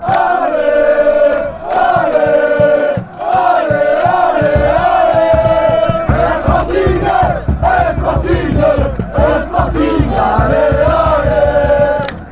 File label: come on, chants; sporting